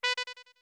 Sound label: Pipes B short